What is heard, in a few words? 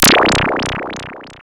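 SYN-FX, synth; E-Lab; X Static Goldmine 1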